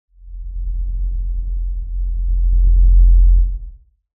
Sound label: Surge Loop; martyn stonehouse